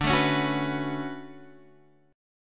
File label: D Major 13th D, chord